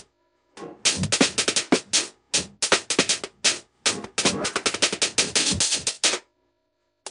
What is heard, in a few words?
Breakbeats recording vocals